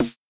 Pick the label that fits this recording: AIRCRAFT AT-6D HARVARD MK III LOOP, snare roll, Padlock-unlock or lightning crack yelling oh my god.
snare roll